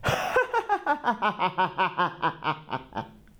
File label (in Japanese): waruiwarai; 悪い笑い2; アッハッハ